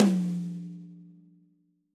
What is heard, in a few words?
Tom Stick Hit Hard Dry Session; Drums